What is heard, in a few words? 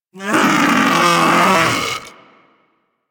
Vocal Death B; Warframe; Hunting Critters; Vulpaphyla